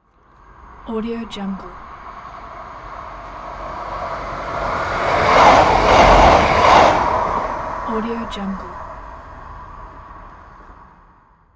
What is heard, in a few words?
modern train pass by